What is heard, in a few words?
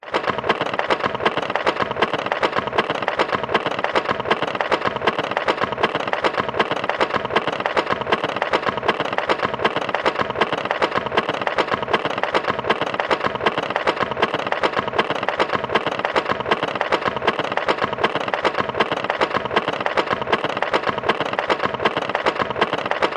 leisure roller coaster wooden hill life designed